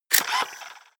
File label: turn on off